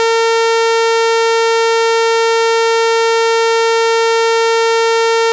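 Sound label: Oscillator Saw 440hz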